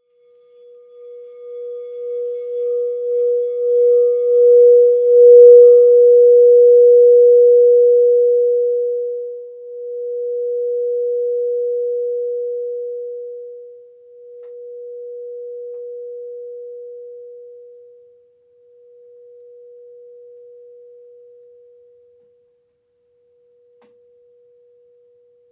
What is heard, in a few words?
11 crown chakra crystal singing bowl